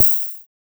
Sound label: Clap Snare